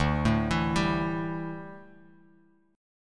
D Suspended 2nd Suspended 4th, Bass Standard, chord; arpeggiated